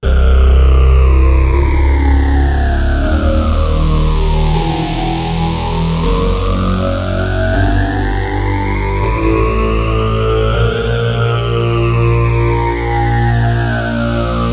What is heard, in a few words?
octa scale pt9pro